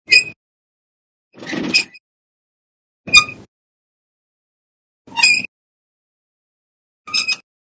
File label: Light Chain Squeaks Mid, swinging light; DoomRTX